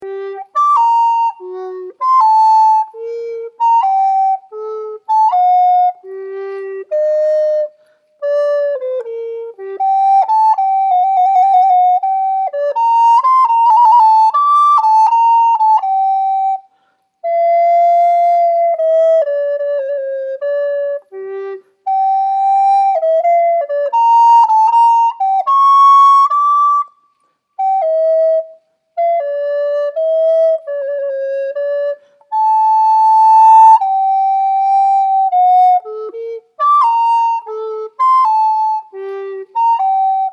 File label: Telemann canon Adagio